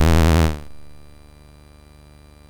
sid MOS6581R2, GAMES; Face Off

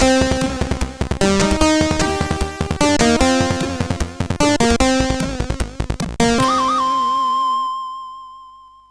sid, CSG8580R5; Gunfright

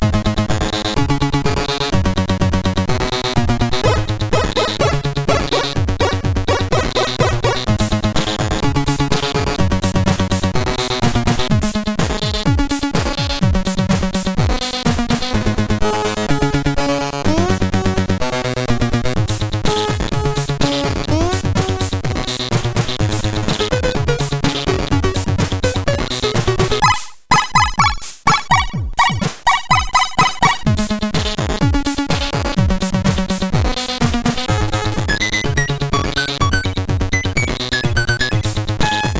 Magazine intro tune, CSG8580; CSG8580R5; hvsc

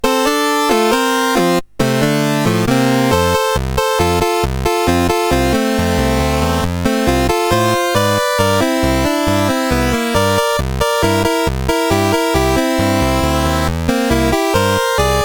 Polka, CSG8580R5

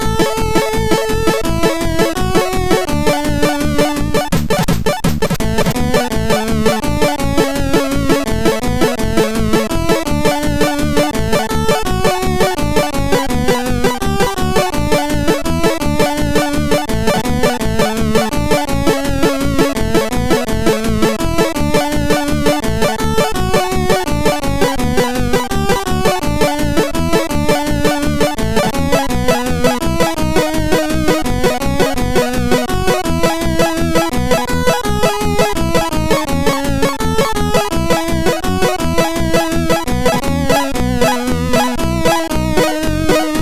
Happy Birthday, sid MOS6581R4

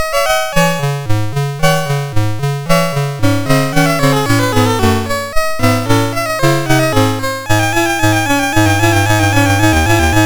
sid MOS6581R4, GAMES; Castle of Life

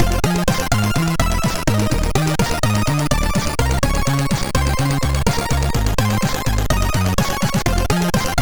Test, MOS6581; hvsc

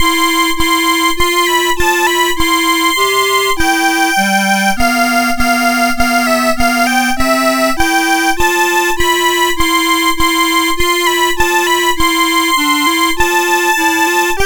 Duet, MOS6581R4; Graduation Match

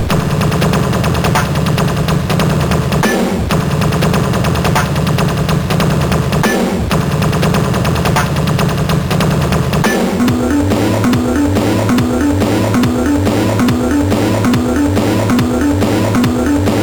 MOS 6581R3

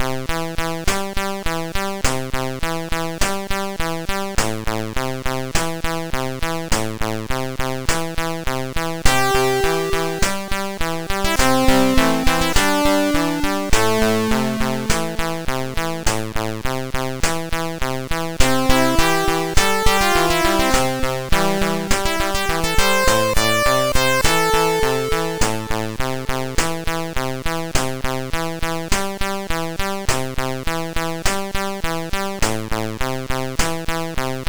Super Synth Sample, sid MOS6581R4

